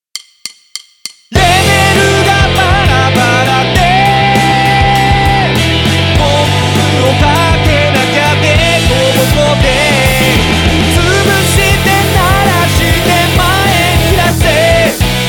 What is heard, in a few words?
high shelf